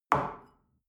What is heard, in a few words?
shell place on table hard; berufe; gastronomie; soundpool